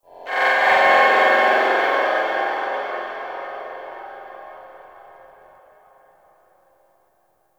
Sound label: Guild Mid Texture; Warframe